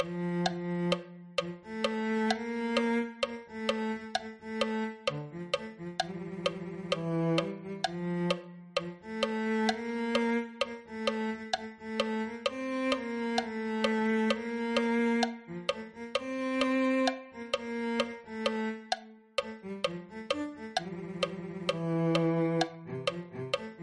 cello

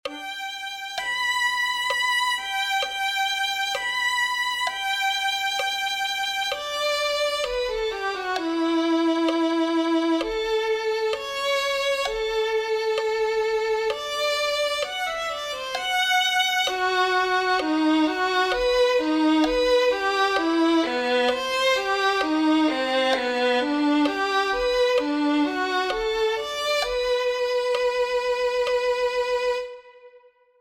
violin

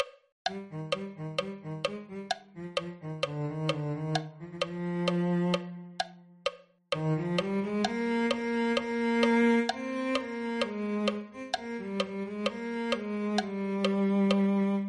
cello

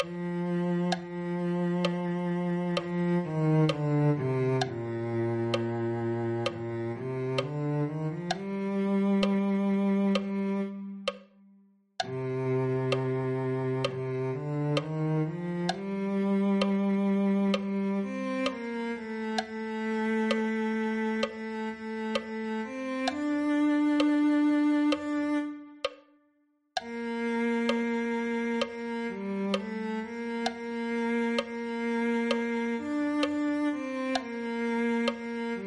cello

